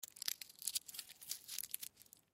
Candy Wrapper, Candy Wrapper Open Tin Foil Crumple; Food Chocolate Small; Food Sound Effects; Foley